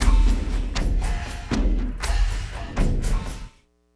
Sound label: Elektro Thunk Cym Long, Drums; Sonic Foundry (Sony Creative Software); Friendly Fire; Marc Steve